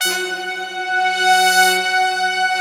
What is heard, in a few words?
big brass, Slow Big Brass; Optical Media International - Sonic Images Library